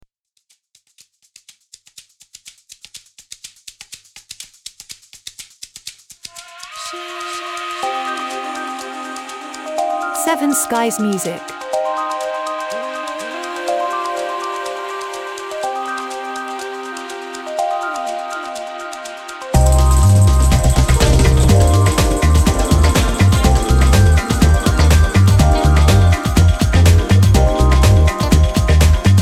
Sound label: Indian Modern Train